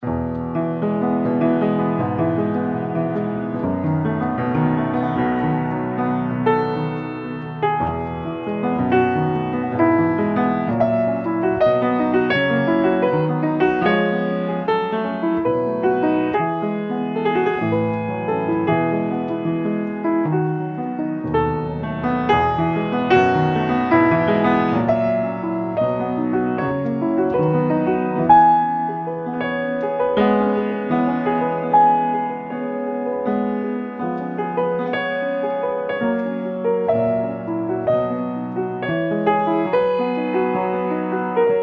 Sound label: Piano; Assassin's Creed Syndicate